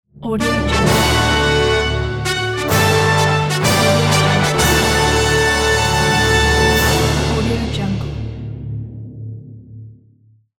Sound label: fanfare